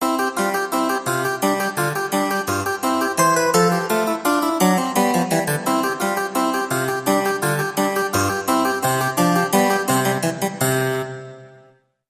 Harpsichord Classical Bridge

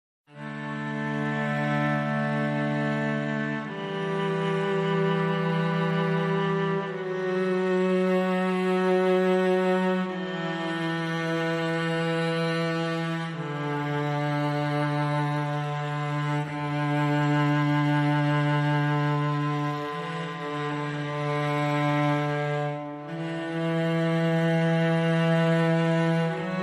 Strings Only

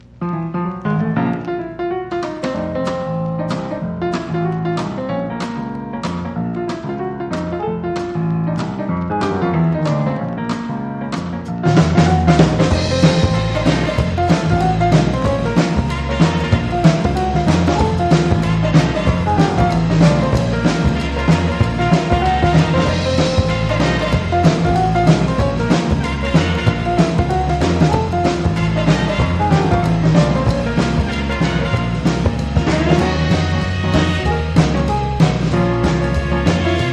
Instrumental

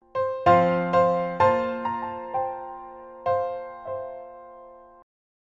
Introspective Piano Etude

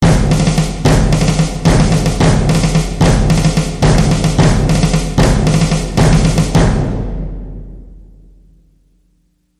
Military Roll

